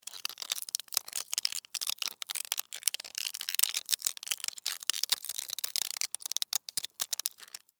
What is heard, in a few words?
Beetle, Fight, Battle, Attack, Insect, Bug; Sound Effects:Animals:Insects; Beetles; Blastwave